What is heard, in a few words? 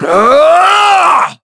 Attack, Vox; kingsraid; voices heroes en